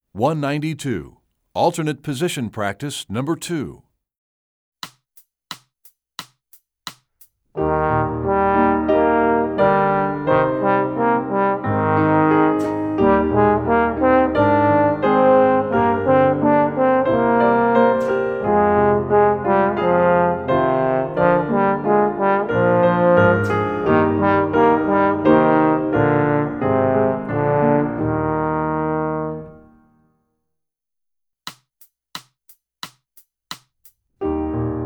Alternate Position Practive, trombone; essential elements

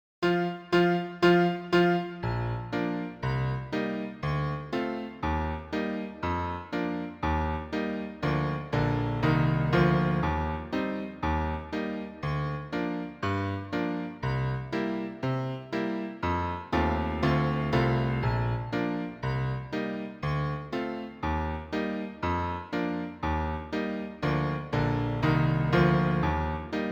piano, trombone

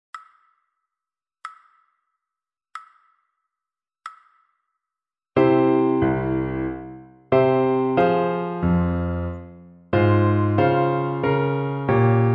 piano; Telemann

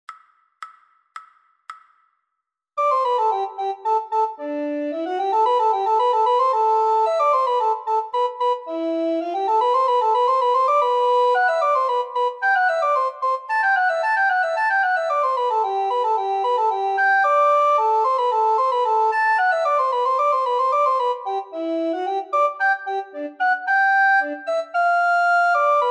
descant, recorder

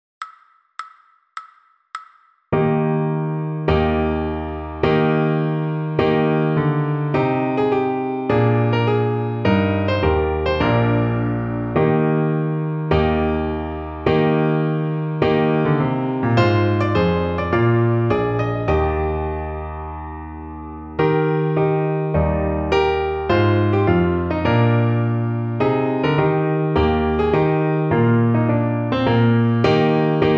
Trumpet Tune piano fast

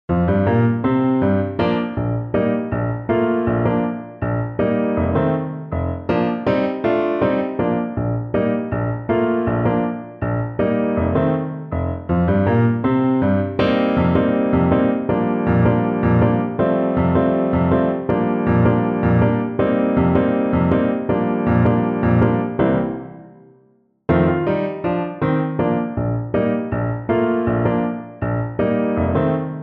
piano

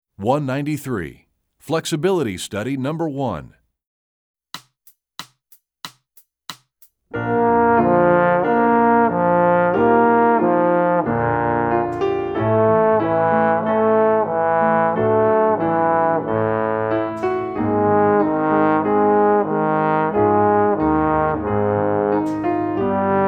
Flexibility Study (Lip Slurs), trombone; essential elements

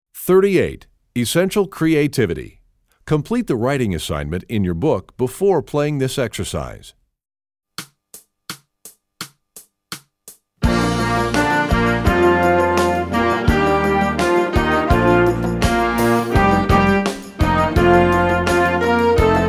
trombone; essential elements

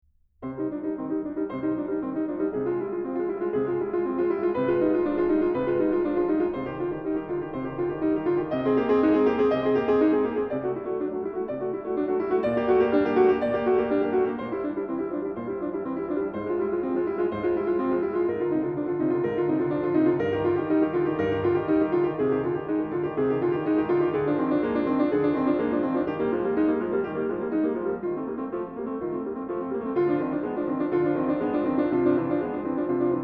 Prelude in C Minor, piano; Bach; Prelude 02; BWV 847

